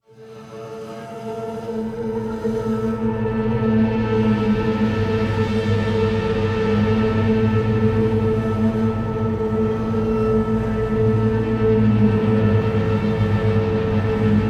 Creepy Ambient

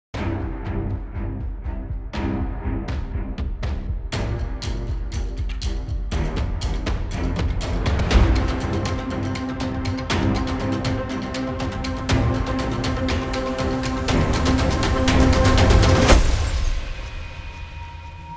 Epic Action Trailer

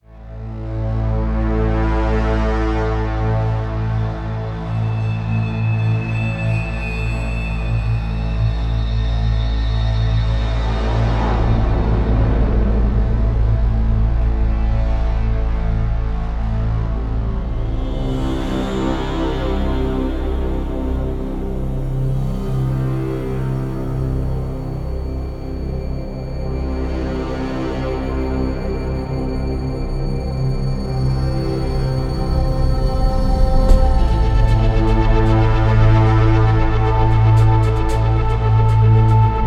Cinematic Horror Tension

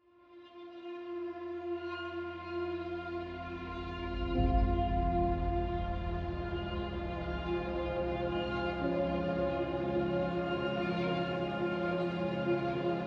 Scary Suspense

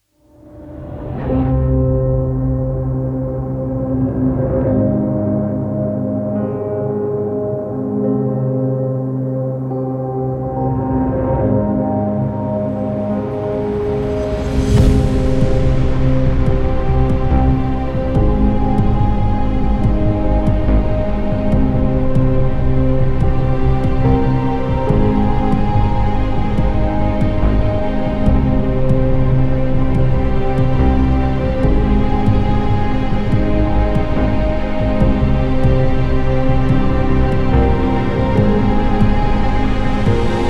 Mysterious Spooky Trailer

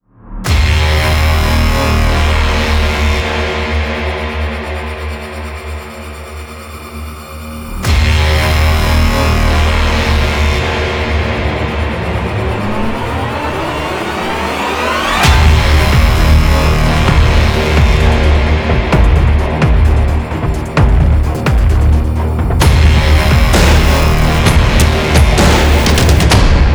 Epic Horror Trailer

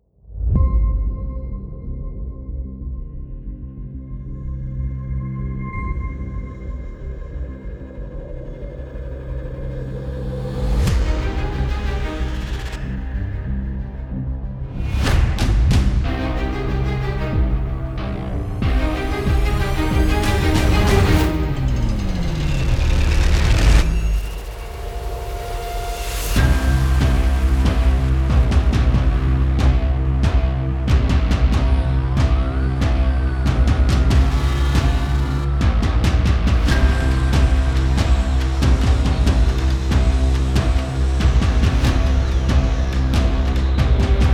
Cinematic Dramatic Trailer

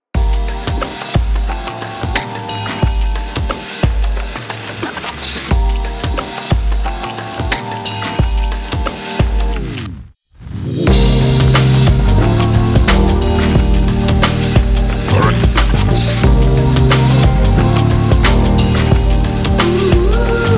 Urban Relax Groove

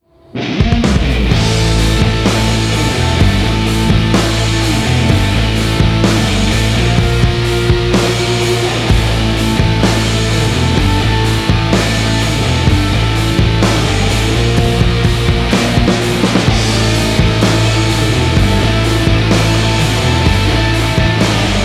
Rock Cinematic Cue

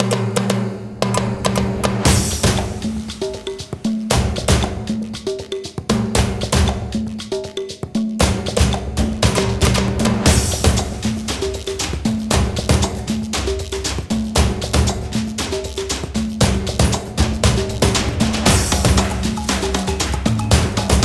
Epic Latin Drums

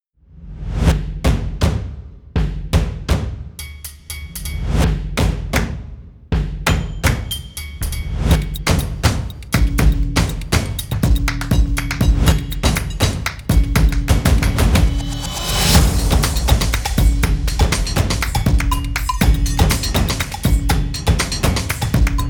Mad Percussion

